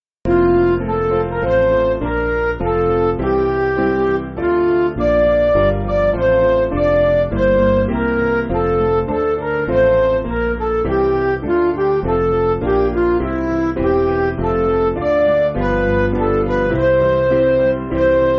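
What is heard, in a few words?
Music Backing Files for Churches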